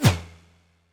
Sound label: Swoosh Super Slow